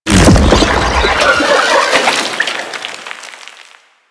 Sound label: Octopede Unearth Throw; Warframe; Man In The Wall